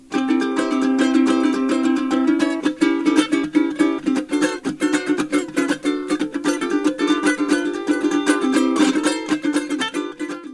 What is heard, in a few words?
concert reso uke; johnson